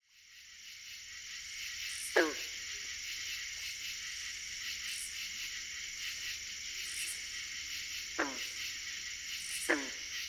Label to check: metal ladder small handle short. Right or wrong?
Wrong